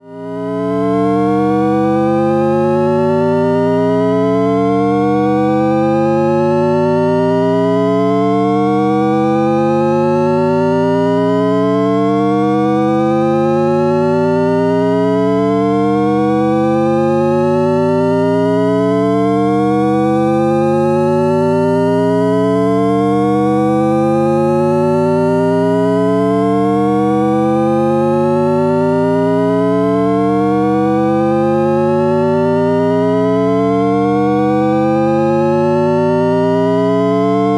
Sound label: Shepard high Tri; experimental